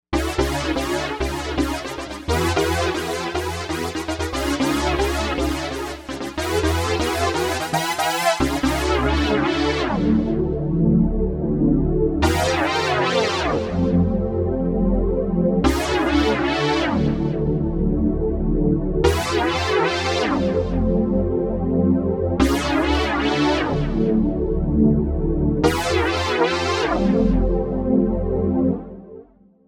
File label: Stab; Novation UltraNova; SuperNova Soundpack Volume 2